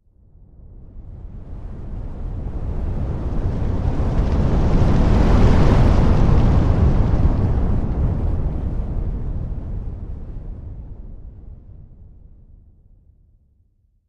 Sweeping Explosion, fireballs

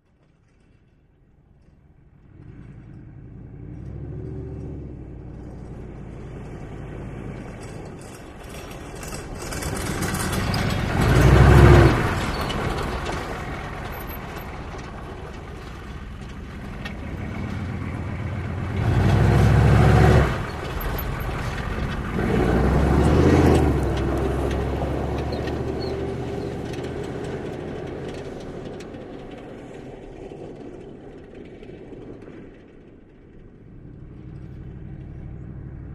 Humvee: Various Driving Maneuvers.

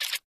Motor, Small; Electric Toothbrush, Stop

